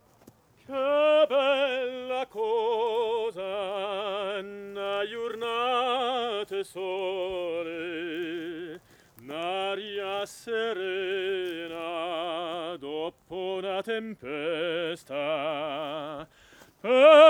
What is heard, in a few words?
tenor chant